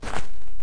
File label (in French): pas hom terre; WehikulCzasu; Time Machine; Bruitages